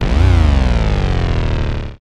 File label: Bass Drop; SoundsCrate